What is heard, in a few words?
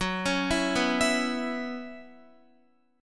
Gb 7th Suspended 4th Gb down Guitar; Gb7sus4 arpeggiated; 4E,4A,5D,5G,5C,6F